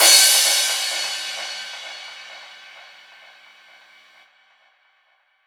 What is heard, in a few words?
Crash, Cymbals